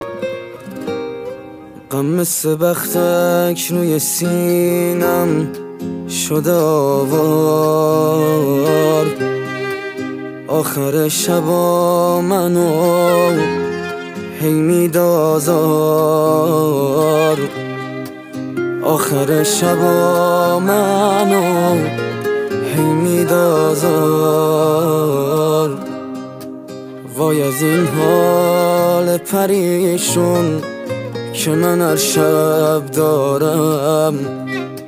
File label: Guitar